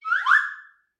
Slide Whistle Up; SoundsCrate

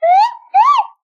slide whistle good laugh

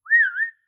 call whistle

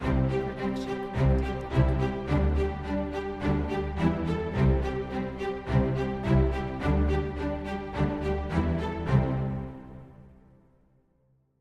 cheerful strings logo; soundscrate

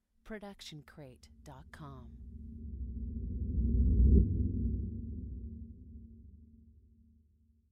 damp reverse; soundscrate

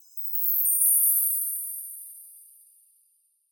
fairy dust; soundscrate

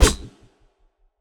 sci-fi blast

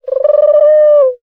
animal pigeon

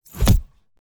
Knife Stabbing; soundscrate

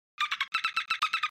dolphin; SoundsCrate

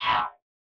fast small space ship fly by; soundscrate

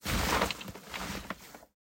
Debris Shuffle; SoundsCrate

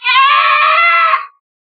horror scream; soundscrate

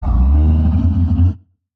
monster roar long; soundscrate

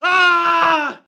man injured scream; soundscrate

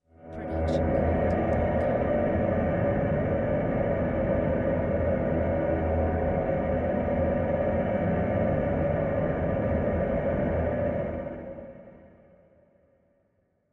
horror low rumble; soundscrate

